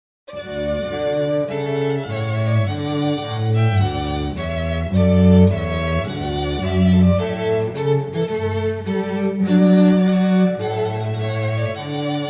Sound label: trumpet voluntary, strings